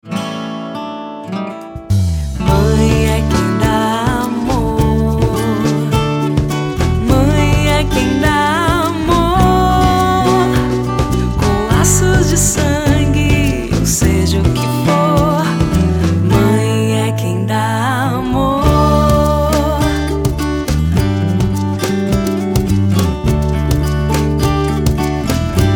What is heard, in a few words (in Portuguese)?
Dia das Mães Jingle sem locução